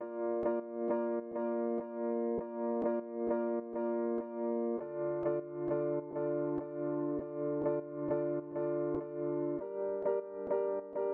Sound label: Keys